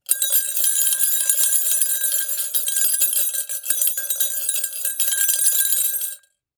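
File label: sound of a teaspoon in a cup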